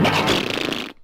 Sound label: Pie Splat; Tom Jerry